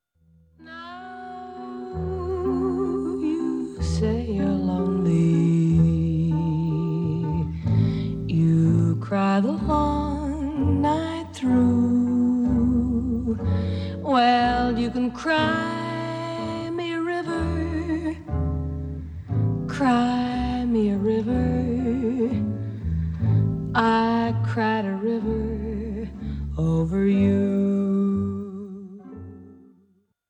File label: alto